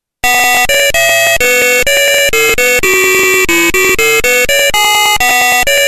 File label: PC-8801; Helicoid